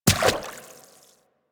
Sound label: Infested Medium Run Water, footsteps; Warframe